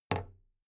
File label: big plastic case handle bounce soft; objekte; kiste